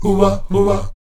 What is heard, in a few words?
Oh-Wa Oh-Wa, Afro Chants; Roland LCDP11 Africa VOL-1